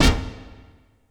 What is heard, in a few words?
Misc Stab